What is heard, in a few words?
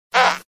puk